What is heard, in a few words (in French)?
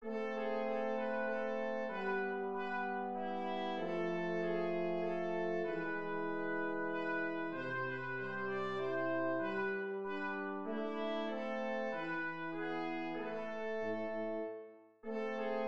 menuet mineur; purcell henry